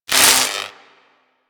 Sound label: Lightning Rifle Spark D; Crp Chain Lightning Rifle